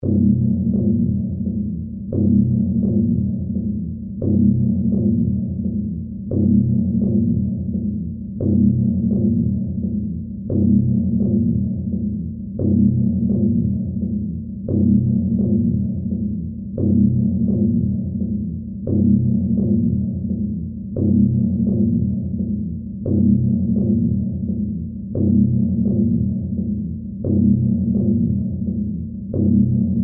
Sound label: Spaceship Engine, Room, Low, Rhythm; Spacecraft; BlastWave